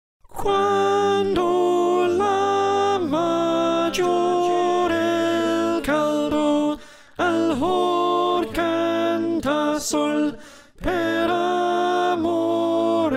Slow Soprano

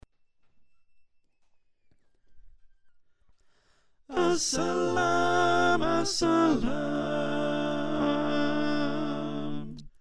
Alto

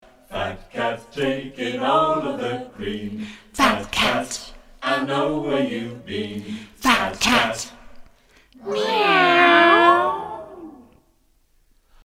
Sop, choir

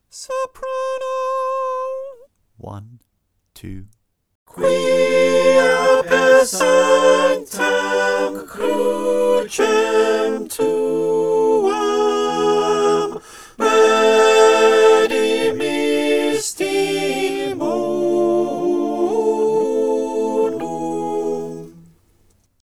sop guide, choir

